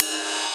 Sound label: Ride, Dubstep One-Shot Drums